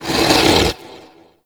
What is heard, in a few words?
Rock Elemental Attack Vocal; Warframe; Warframes; Brawler